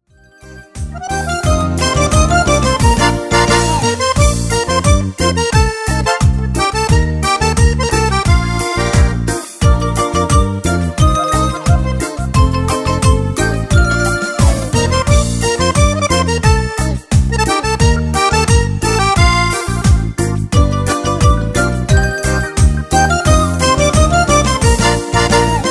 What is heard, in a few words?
kalimba